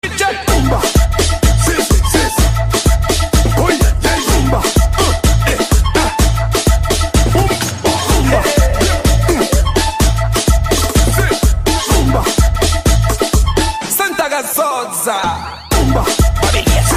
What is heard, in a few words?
Rumba